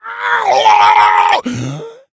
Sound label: death, infected male; left4dead; Source engine